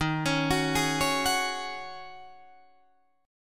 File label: Eb Minor 11th Eb down Guitar Drop D; Ebm11 arpeggiated